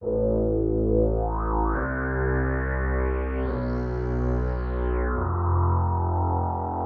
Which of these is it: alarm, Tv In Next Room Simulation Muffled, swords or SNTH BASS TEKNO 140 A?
SNTH BASS TEKNO 140 A